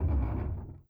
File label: door large wood movement start; Rust